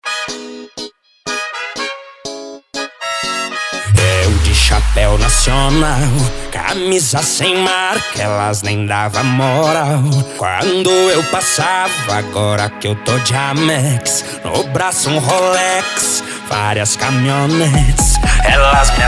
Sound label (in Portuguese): especial sertanejo; graf sound